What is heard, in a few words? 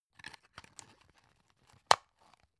drug close soft; berufe; arzt